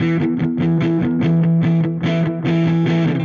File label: Dirty Chunk Guitar, Indie Rock